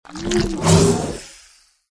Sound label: turtle attack